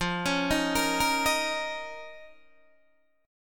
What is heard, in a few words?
F 7th Suspended 4th Sharp 5th F, Guitar, DADGAD; 15,16,13,15,13,13